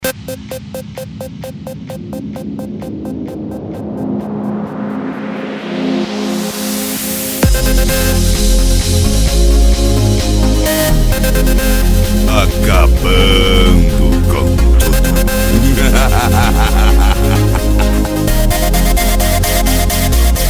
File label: sound car